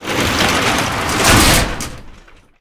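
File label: roll metal door close; favela; Call of Duty Modern Warfare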